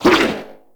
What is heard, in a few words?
Corpus Struggle; Warframe; Amalgam Override